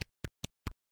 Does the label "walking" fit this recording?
Yes